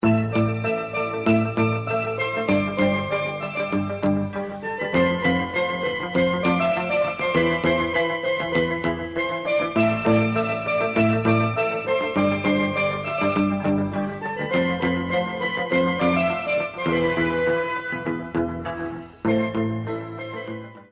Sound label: yogathon music